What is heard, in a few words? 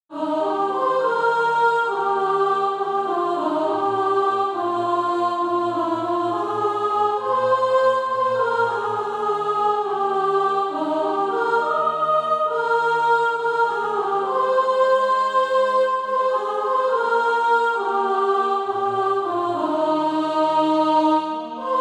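soprano, Practise Music; Free from the Law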